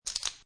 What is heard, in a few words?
Poker Chips Drop Three Chips In Hand; Lynne Music